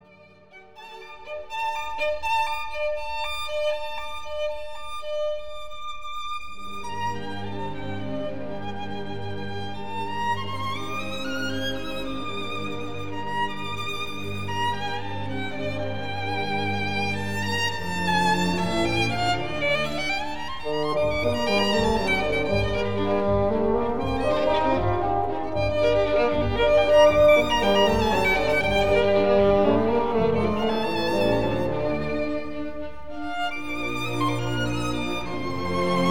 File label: Beethoven Violin; Wiener; Kondrashin; beethoven violin mvt 3